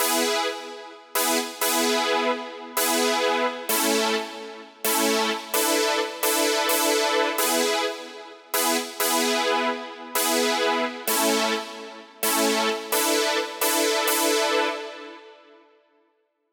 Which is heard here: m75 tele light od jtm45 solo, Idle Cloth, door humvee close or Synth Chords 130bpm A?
Synth Chords 130bpm A